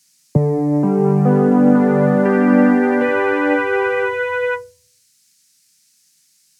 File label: Piano; j106